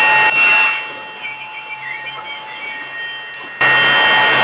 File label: 160M noise